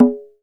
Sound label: CON TSFL, Cuba CONGA; AKAI S6000 CD-ROM - Volume 5